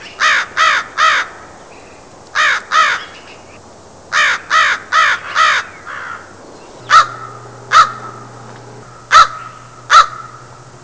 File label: crow